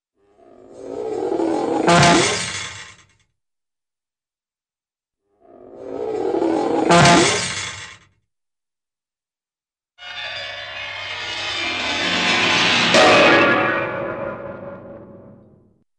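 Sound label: Rubbery & Metallic Stretch with Crash Piano Falls, cartoon; Hollywood Edge; Cartoon Trax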